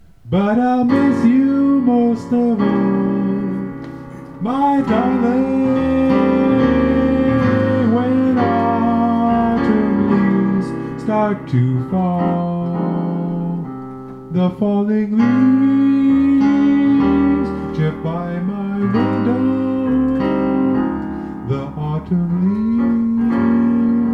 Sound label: vocals and piano and trumpet